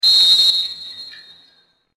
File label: whistle; csgo